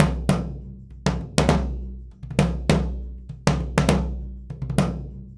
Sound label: 100 SURDO; Univers Sons; Basicussions